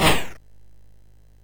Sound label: sid MOS6581R2; California Games